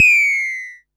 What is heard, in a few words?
Perc Synth Raw; sci-fi